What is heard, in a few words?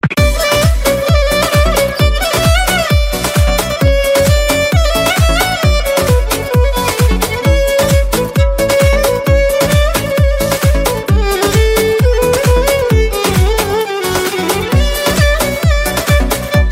arabic style